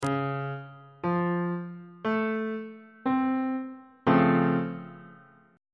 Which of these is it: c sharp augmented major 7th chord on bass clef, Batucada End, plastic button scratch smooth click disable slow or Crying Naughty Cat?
c sharp augmented major 7th chord on bass clef